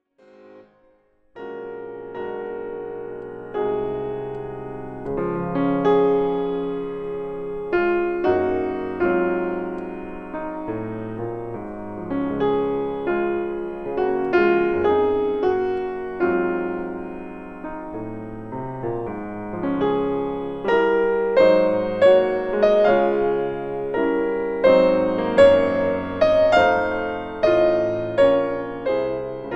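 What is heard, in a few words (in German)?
klassik klavier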